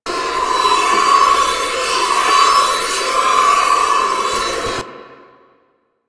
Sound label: Hoverboard Grind Generic Loop; grind loops; Warframe; Vehicles; Venus